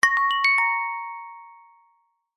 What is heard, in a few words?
multimedia alert chime short musical notification cute child like